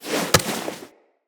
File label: Item Pickup Foley; Characters; Tenno